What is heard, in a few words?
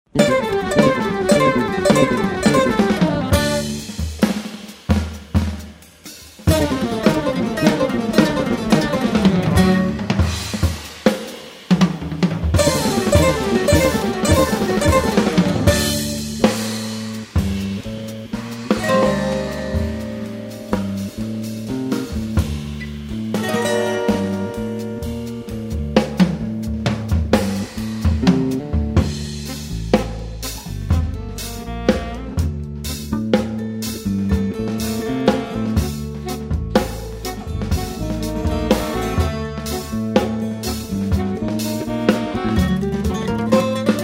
Drum On Drum On